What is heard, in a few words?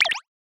decision, button